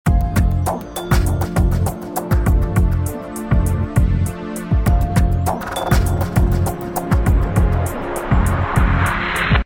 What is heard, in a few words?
Orchestral Science Fiction; asplosion